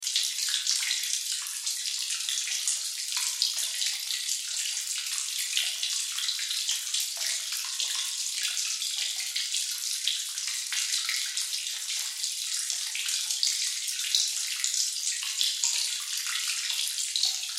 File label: Water Drips Sewer Cave Cavern Reverb Loop; Dripping; Blastwave